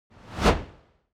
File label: elements fire torch bright flutter whoosh